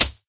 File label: hit grass, weapons; source engine; dod